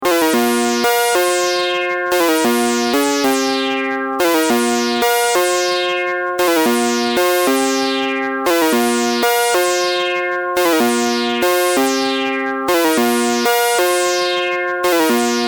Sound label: Solus Analogica